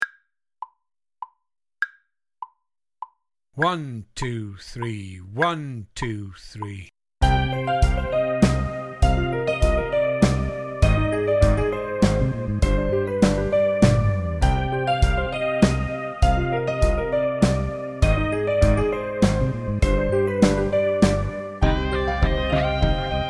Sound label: Ripples Full Mix, drum kit